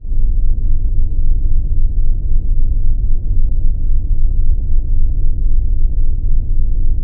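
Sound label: machine ambience; push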